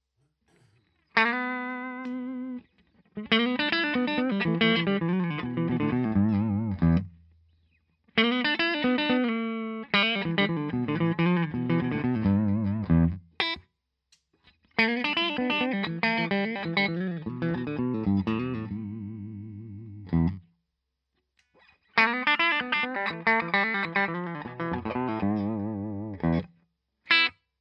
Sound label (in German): Alle PU Clean Single Notes